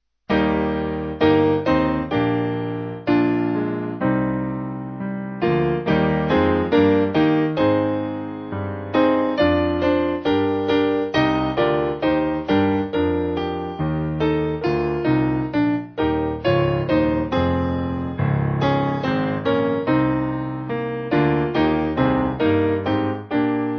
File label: Piano